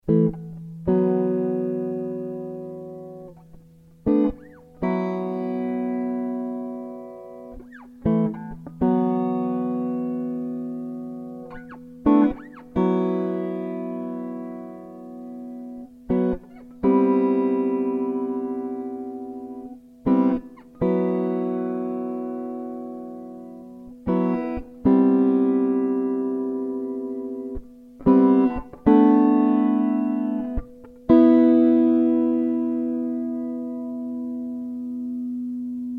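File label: Em 120 guitar jazzy riffs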